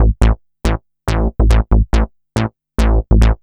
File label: bassline, dance; Basses